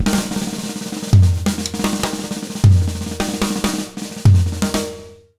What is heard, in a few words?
100 B BEAT, Jazz Latin Drumloops